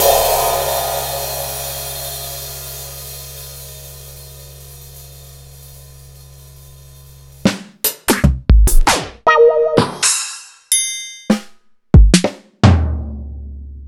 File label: EMU PX-7; m8